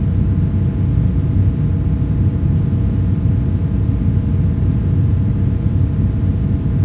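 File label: quiet cellblock ambience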